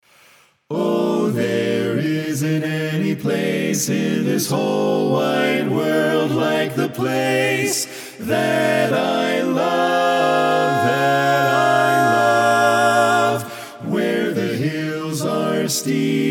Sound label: Lead Left